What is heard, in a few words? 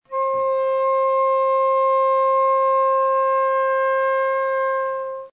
C5 alt, flute; classical